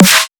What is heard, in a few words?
fart snare